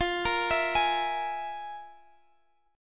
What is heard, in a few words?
F Minor Major 7th Double Flat 5th, F; FmM7bb5; arpeggiated; x,20,20,21,21,x